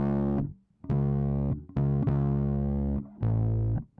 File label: bass; musdb18